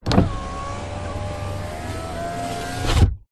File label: transport car suv holden captiva electric window up